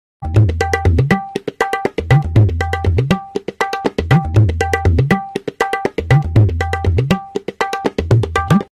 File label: tabla verb